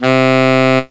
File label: C3 SAX